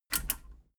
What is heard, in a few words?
metal button radio click power on fast